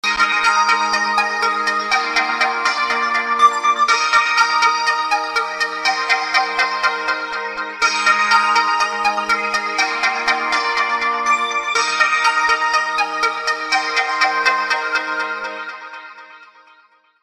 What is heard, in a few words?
Samsung Galaxy Tab S8 Ultra, zil sesi